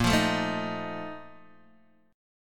Bb Major 7th Suspended 4th, Guitar, DADGAD

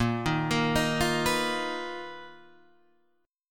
B Major 7th Flat 5th A sharp, chord shape

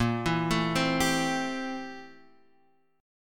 A Minor 7th Bb, Am7/Bb chord; Am7/Bb arpeggiated; JGuitar

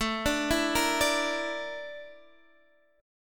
A sharp Major 7th Flat 5th A

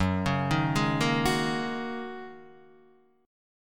F sharp 6th Add 9th F sharp Guitar DADGAD

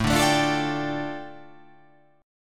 A 7th Suspended 4th Sharp 5th, A, down

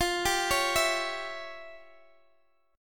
E Minor 6th F; arpeggiated; Em6/F

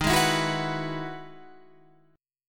E Major 9th D sharp, chord

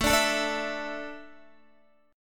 A Suspended 4th, Guitar; strummed; JGuitar

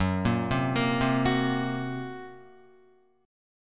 F sharp Major Flat 5th, Guitar; arpeggiated; 4F,4A,5C,5G,5C,6E; 1,1,0,3,0,2

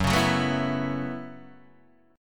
Gb Minor 11th Gb Guitar DADGAD

